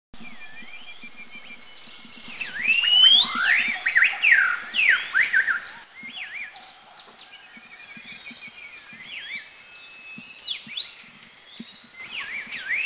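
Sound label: Blue-winged Laughingthrush song; India; Eaglenest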